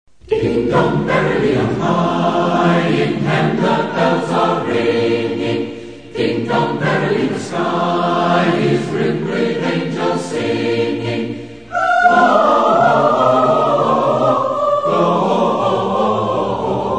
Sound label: Ding Dong merrily; The Snow lay on the Ground